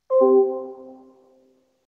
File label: unit ping